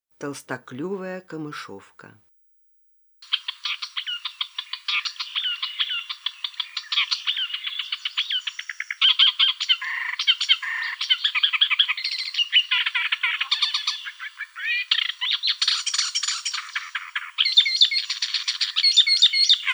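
tolstoklyuvaya kamyshevka golos, thick-billed warbler voice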